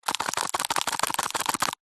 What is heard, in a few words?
staples box shake; office